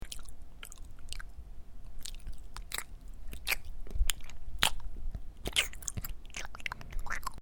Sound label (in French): Caverne